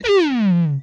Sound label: Sci Fi Gun, Blast; Space Blasters2D